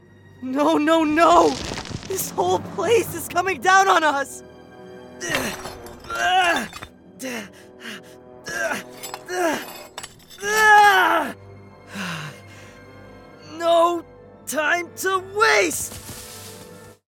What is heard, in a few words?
Cave Explorer Collapse Dramatic Efforts; male voiceover